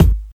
1990s smooth kickdrum sample g sharp key, kick single hit tuned to the G# note